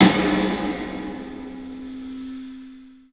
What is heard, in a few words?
PA DEEP R, CLUB SN VRB; 3D Sound; 3D Drums